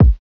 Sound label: Kick - C; Lofi; Eternity